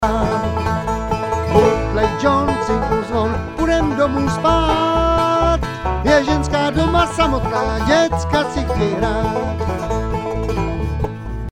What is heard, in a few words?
vocLead, bluegrass